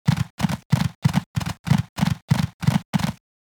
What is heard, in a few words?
Skewer movement